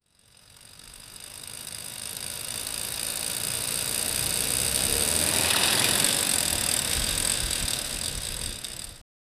bicycle running sound

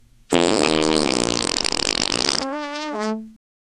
a wet bubbly fart like, body; sound effect

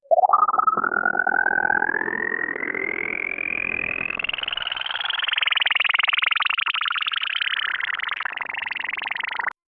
organic bioluminescent mo, biology

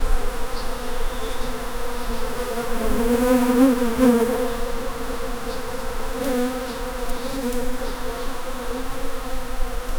bees buzzing in a garden

